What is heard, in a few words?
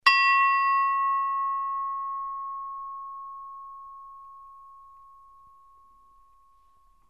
6 kg, bells; Pyatkov